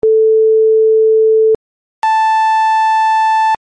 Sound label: sine plus fwr